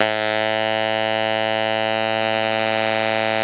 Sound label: TENOR A 2, Saxophones; Greytsounds; Philip Wolfe David Crozier's Saxophones